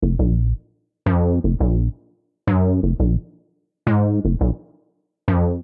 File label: Houz Bass Bb Minor 170 bpm; MIDI Clips; Tonal; Bassline; Skitter and Step; Ableton Factory Packs